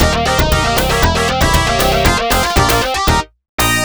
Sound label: Round Up (Round Clear); fantasy zone; system 16a arcade